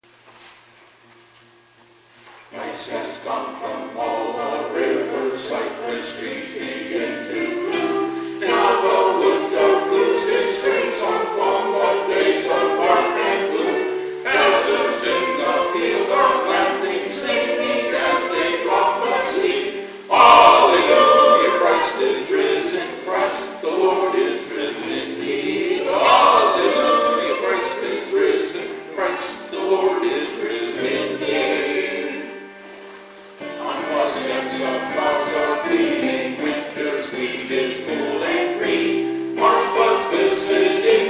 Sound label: alleluia christ is risen, choir anthems